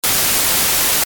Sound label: white